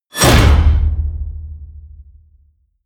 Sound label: wham, whooshes